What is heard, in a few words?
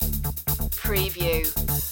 disco loop